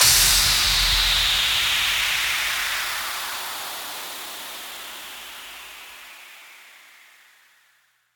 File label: TRAP LOOP END bpm134; 16 or 32小節のリズム・トラック